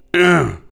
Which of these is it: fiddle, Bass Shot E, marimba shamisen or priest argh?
priest argh